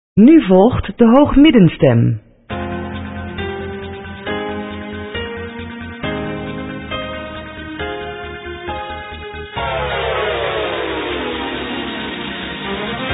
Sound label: sopraan